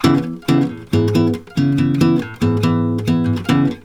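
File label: C# min, 140 minor chord, brazil bossa; USB Soundscan; AKAI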